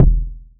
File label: tight lows kick single hit e key; kick drum samples